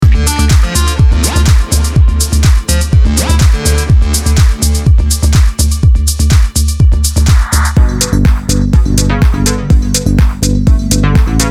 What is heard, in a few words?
Melodic Techno